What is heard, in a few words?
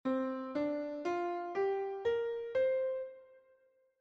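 Penta, Pentatonic scales, bells; Penta 4; Pebworth bells; Pebworths different rings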